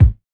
00s round bass drum g sharp key, Royality free bass drum tuned to the G# note